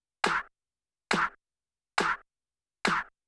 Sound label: DELAY CLAP, Techno 138 BPM; RED ALERT